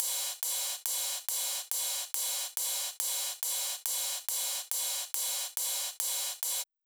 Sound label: drum Loop 140BPM Ride